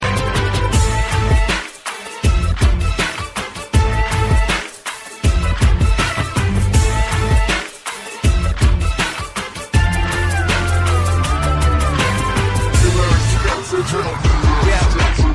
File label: Hip Hop Tone